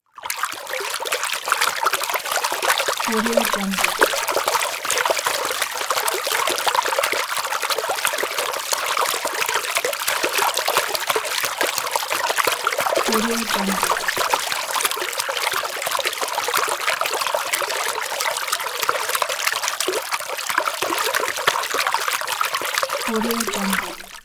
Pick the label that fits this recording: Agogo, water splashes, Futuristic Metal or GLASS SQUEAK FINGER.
water splashes